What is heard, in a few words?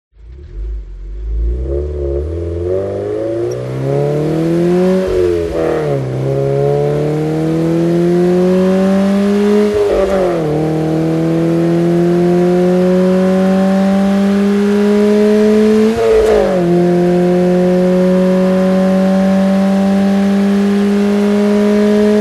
TRANSPORTATION CAR Toyota GT86 Acceleration Long Fast